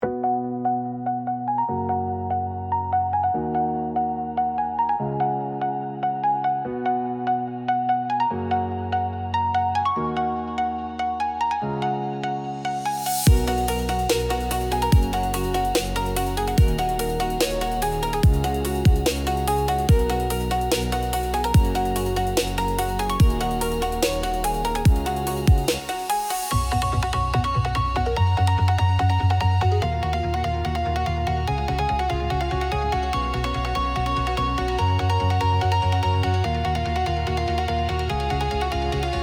Electro encouraging electric pulse

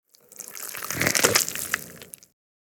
Guts and blood squeezing slosh

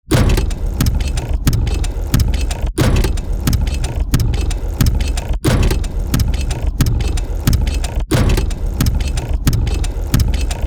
Hydraulic pressing machine loop

